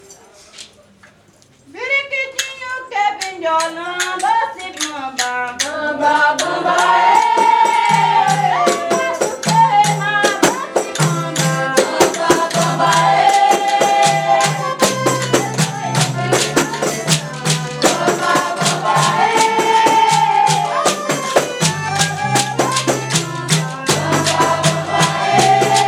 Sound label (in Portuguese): fanti baiao de princesas periquitinho; Baião de Princesas é um ritual especialíssimo e único que acontece apenas uma vez ao ano